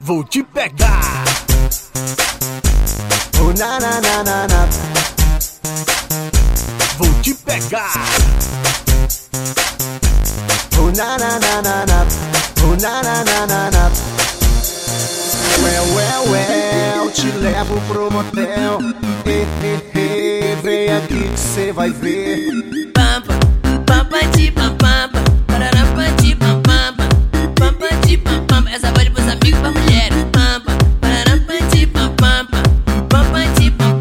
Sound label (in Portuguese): eletro funk retro